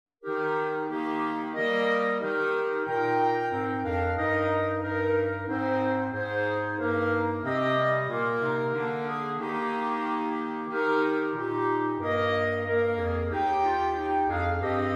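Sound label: Angels Realms clarinet, jazz arrangement; angels from the realms of glory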